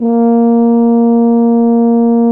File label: tuba loop note